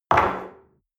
espresso place on table slide medium, restaurant cafe